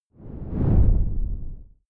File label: whoosh simple low short grainy